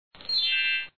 Chime dwn; chick

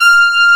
french horns F5; Star Trek JR

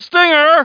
STING; mtm2demo

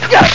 Pow; KUNG FU

